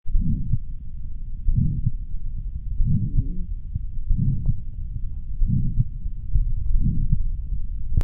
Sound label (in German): Aortenstenose